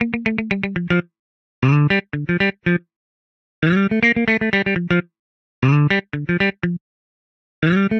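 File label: Guitar African Funk G minor 120bpm; Guitar and Bass; Ableton; Factory Packs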